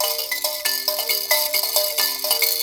VIB KALIMP, VIB KALIM

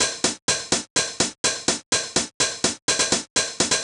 drum hat 125, Classic Chicago House Drum Loops

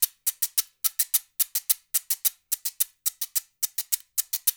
LOOP R, Percussion; InVision Interactive; Percussion Slam; PROGFROG

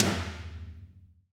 TOM TOM, Roland

